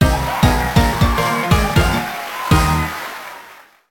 Coaster End, Coaster music

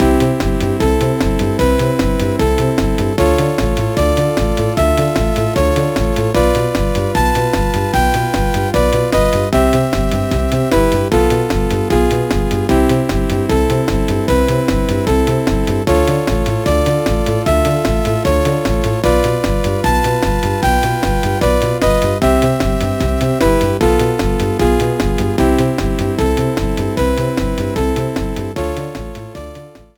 World 4 Map; SMA4

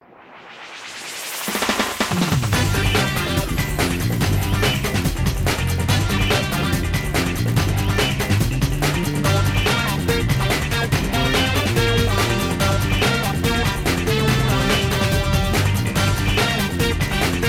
snowboard boost; Groovepipe

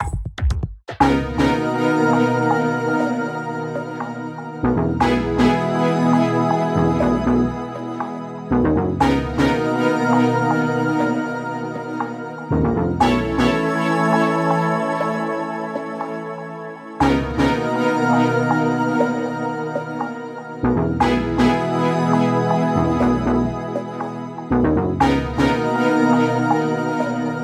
Choosing Character

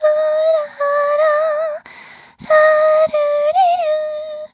Peach Hum; Paper Mario: The Thousand-Year Door

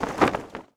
Goal Pole Flag Change Big; SM3DL

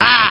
Krunch grunt

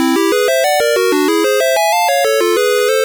Jingle; Stage 12

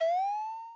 Long Jump

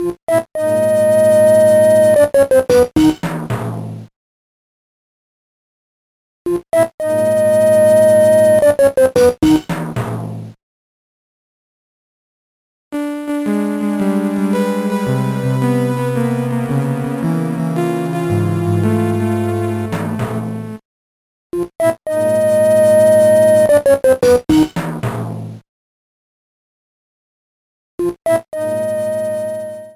Fortress BGM; SMA4

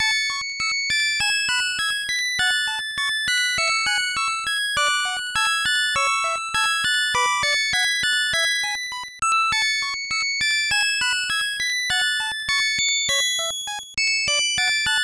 Five Music Boxes Unite, A music track; WL3

